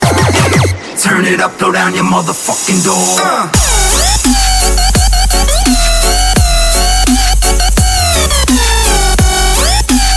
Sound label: dubstep electro